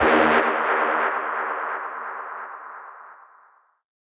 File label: Synth Sounds; neuro; Stanza